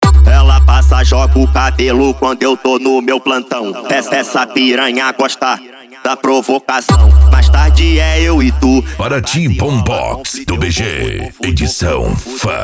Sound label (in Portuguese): bombox funk